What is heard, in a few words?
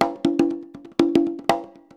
120 CONGAS, Percussion Loops